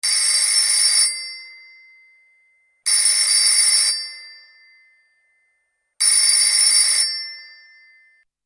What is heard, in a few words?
old fashioned phone ringtone